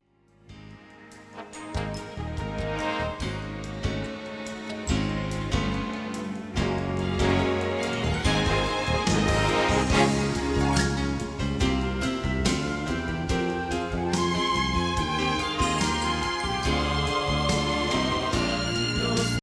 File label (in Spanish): Bajo